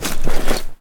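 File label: inventory backpack on; STALKER; IWP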